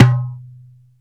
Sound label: darbuka dum, Ethnic Percussion